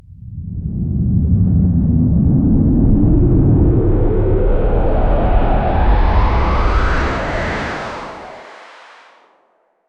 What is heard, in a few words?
a giant alien ship taking